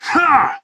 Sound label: effort; tf